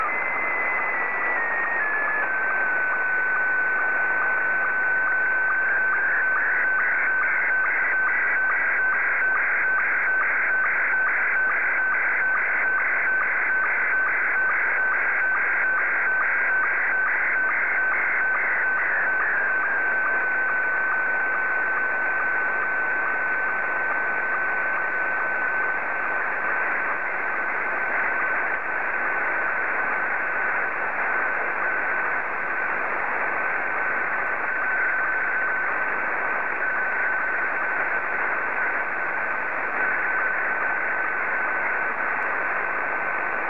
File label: sstv